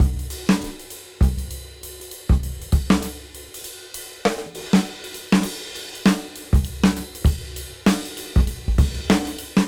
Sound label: KL Beat 98 BPM Swing; AMG - Keith LeBlanc Phat Butt Jams